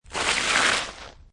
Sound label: Snow And Ice Wet Slush Slide; Weather